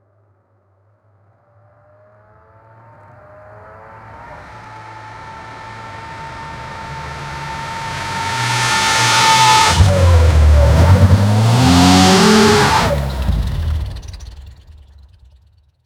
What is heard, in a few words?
sports car